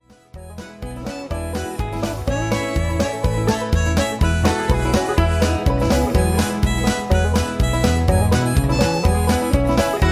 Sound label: Gospel Medley Instrumental